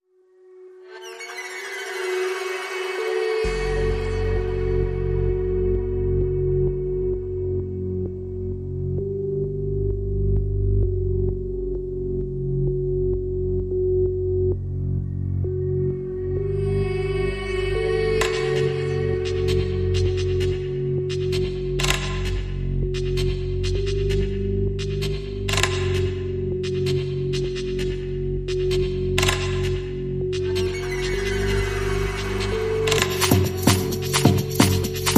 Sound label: Airy Whirl, Ambient